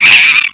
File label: bug; bug catcher